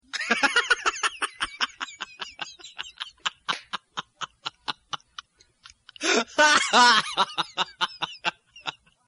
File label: laughing